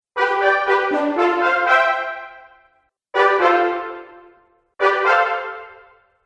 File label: Fanfare; Musical; Fanfare Collection 02; Musical Sound Effects